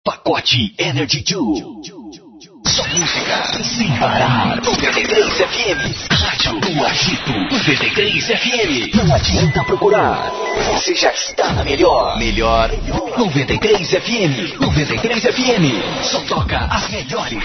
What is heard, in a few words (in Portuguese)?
vinhetas energy